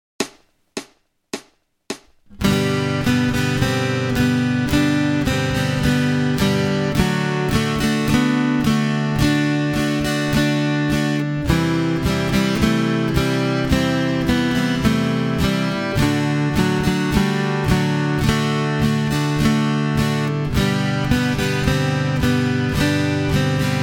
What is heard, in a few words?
Acoustic Accompaniment, acoustic guitar